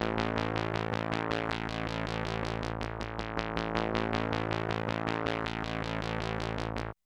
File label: VCS PULSE, Analog SEQ; AMG; Global Trance Mission VOL-1